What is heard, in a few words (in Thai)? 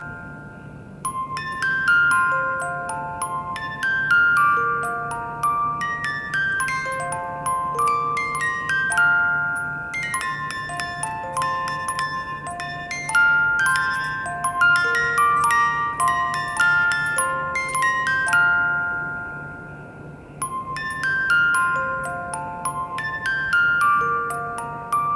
กล่องดนตรีเพลง Jingle Bell; 31. กล่องดนตรีเพลง Jingle Bell